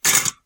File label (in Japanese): kangomi